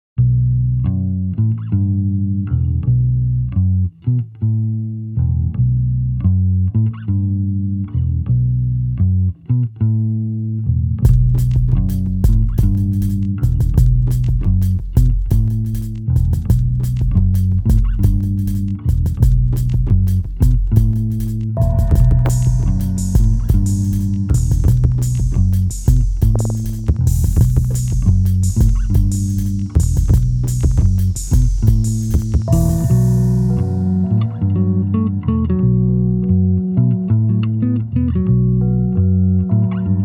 basstest; weeklybeats-2020

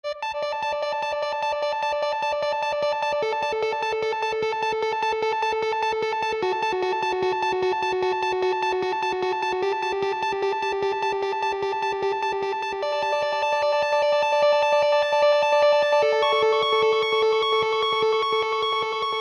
drums are funny; weeklybeats-2012

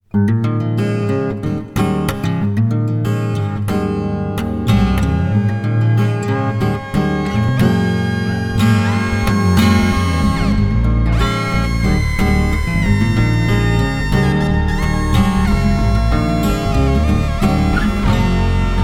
guitar vs microbrute; in a few takes; weeklybeats-2016

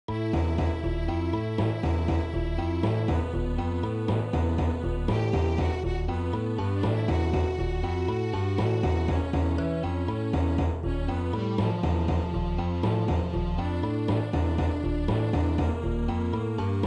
midi sketch; weeklybeats 2024

